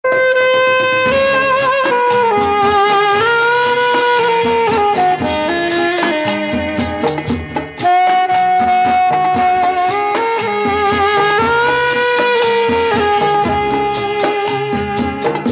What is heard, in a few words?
old movie trumpet; Indian